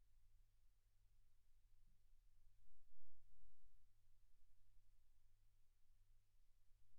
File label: silence 48kHz